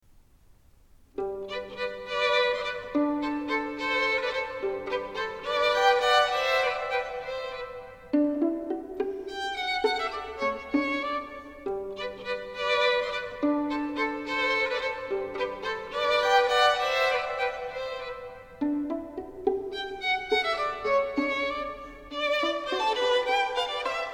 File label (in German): Violinduo; Haydn